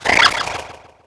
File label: triton hit; DAoC